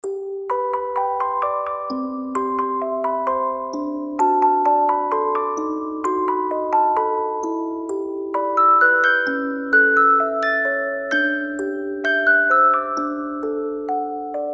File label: Music Box; Anime 'Fruits Basket' OP